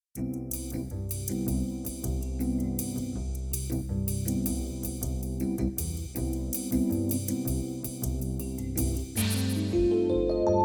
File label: SC-55mkii